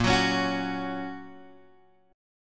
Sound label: A sharp Minor Sharp 5th A sharp down Guitar DADGAD